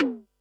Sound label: tom, Pearl SC-40 Toms